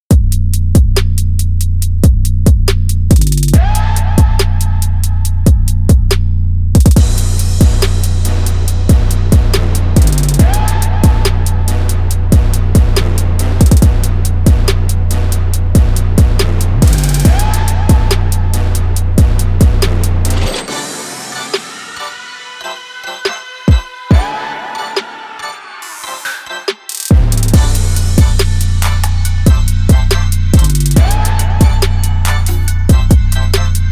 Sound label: trap